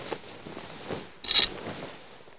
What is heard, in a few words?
drop; Mafie 1